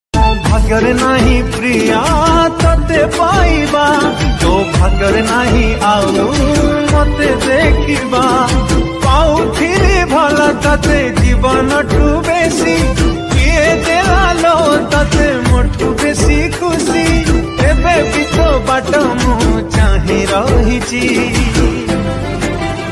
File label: Odia Sad Ringtone